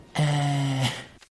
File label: euuh